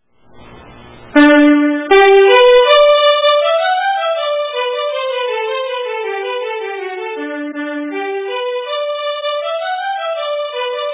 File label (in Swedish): Engelska